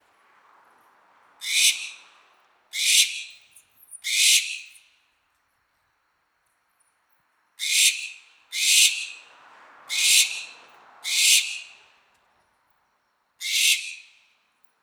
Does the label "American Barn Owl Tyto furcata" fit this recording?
Yes